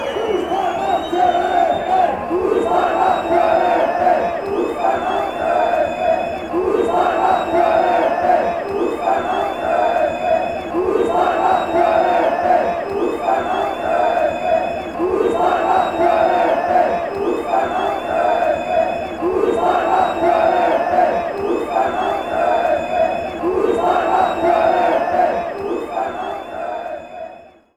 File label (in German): fussball salzburg, fanchants